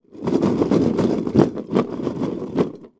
foley cardboard box with christmas decorations in shake; zapsplat